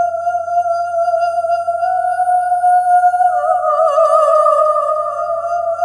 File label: singing; Erie